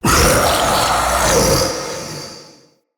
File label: Male Vocal Attack, Enemy; Mockery; Mockeries